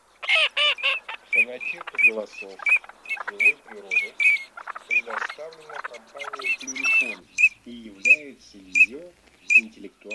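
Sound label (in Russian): Чирок-свистунок Alzavola, манок; plurifon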